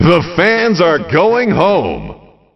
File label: fans leaving